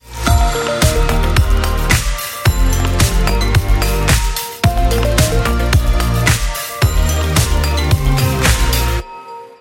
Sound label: OnePlus Ringtone